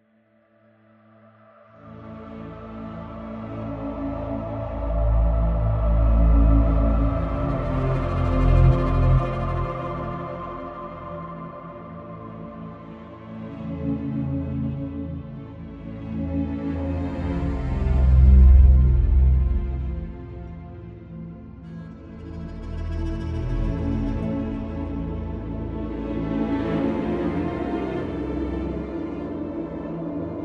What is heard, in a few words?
Loop Suspense; Game of Thrones: A Telltale Games Series